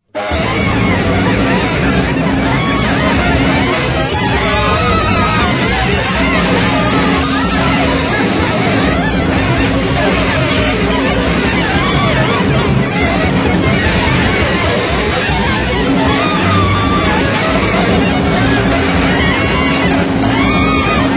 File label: uptempo swing